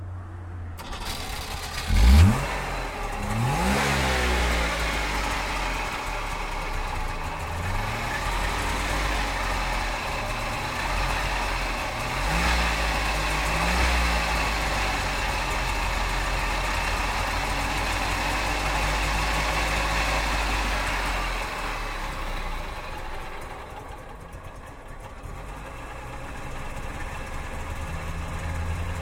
Barkas

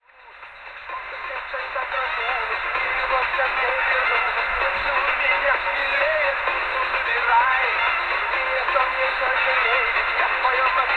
Kleinradio puck

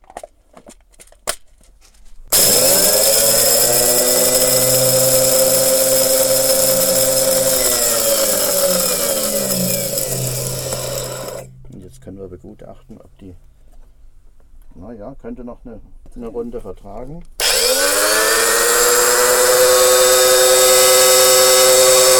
Kaffeemuehle Pirouette

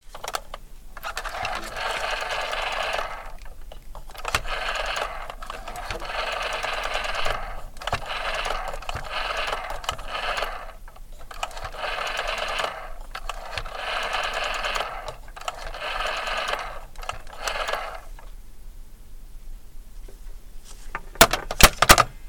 Tischtelefon W 63 UTE

